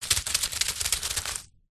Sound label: animals insect spider movement scuttle leaves undergrowth